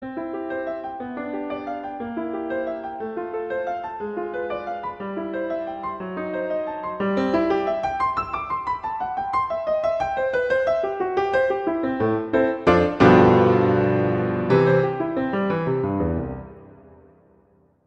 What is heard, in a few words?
Prelude II - C major; Clementi; Preludes